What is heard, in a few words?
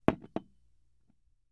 Mug Down On Table; Glass Mug Set Down On Wood With Light Thud. - Glass Set Down